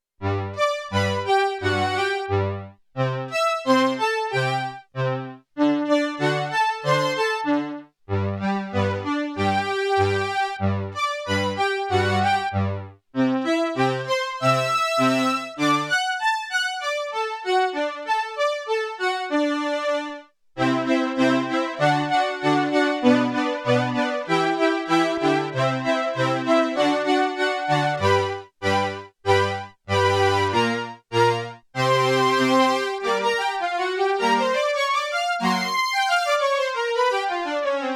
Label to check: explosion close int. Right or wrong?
Wrong